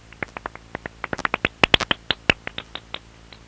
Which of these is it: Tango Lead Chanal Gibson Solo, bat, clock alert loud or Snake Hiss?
bat